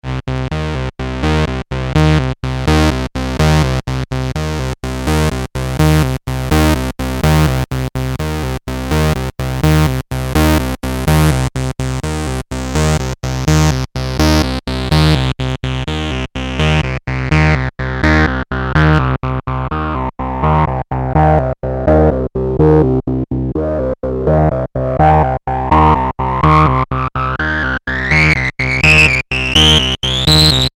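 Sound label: polivoks sequence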